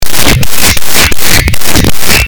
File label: clap go brrr